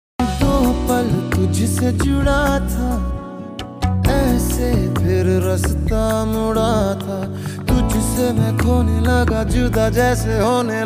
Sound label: Sad Song Ringtone